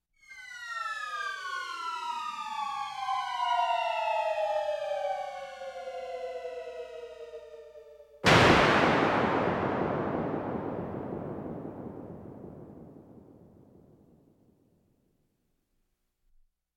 Photonenschuss; Star Wars

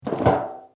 Muelleimertonne oeffnen

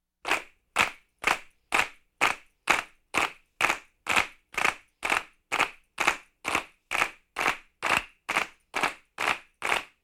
Konzertstimmungen Applaus Eintoenig

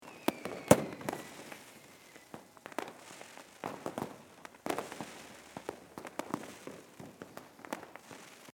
Silvester Funkenregen

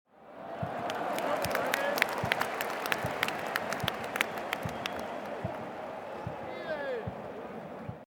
Stadion Fan und klatschen

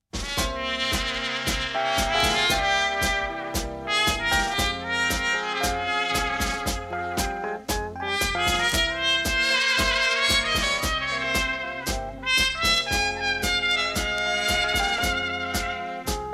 Tanzrythmen Tango Mit Blaesersatz

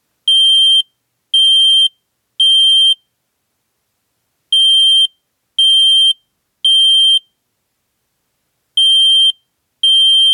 Rauchmelder

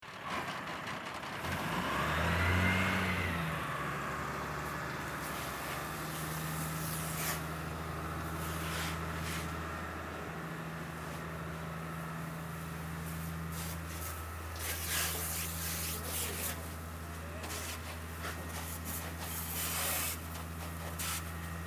Silvester Auto wird geloescht

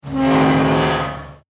Metallquitschen

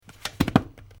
schliessen

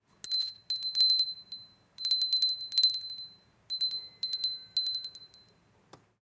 Gloeckchen

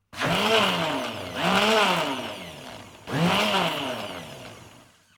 Mixer Intervall

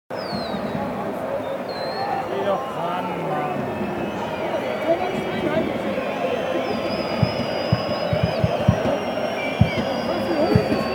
Stadion Atmosphaere

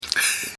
lachen maennlich

